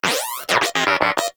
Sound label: Inquisitive Vocal Agree; Pets; Warframe; Moa